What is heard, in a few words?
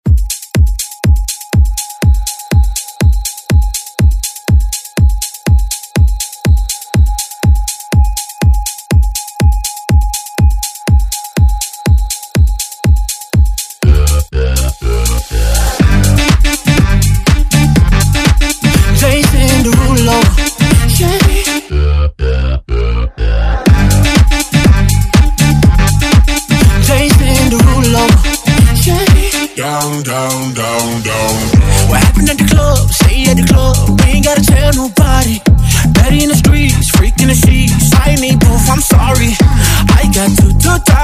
Dance Redrum